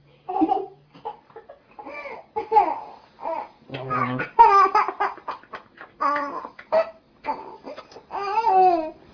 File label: laugh